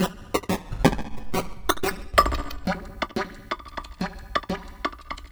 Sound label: spitty 090bpm, downbeat dance grooves; Zero-G